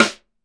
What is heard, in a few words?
SD, KIT Real-Kit; 90 s Sample CDs; Sound & Vision - Gigapack I CD 1 (Roland); Real-Kit m 8